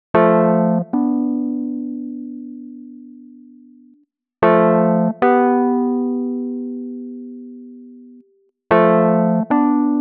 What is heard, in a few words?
G112 Keys